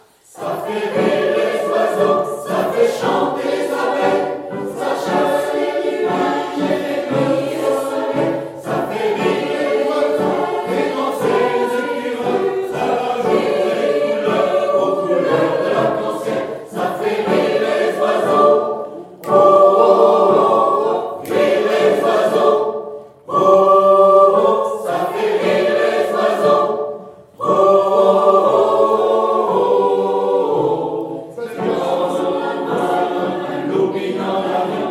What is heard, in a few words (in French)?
tutti